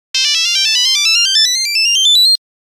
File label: Nokia Buzzer Long scale; Windows Phone apps